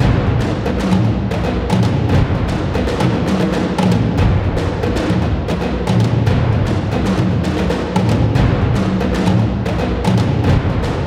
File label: Percussion Only; Project Nimbus; Rise! Mirai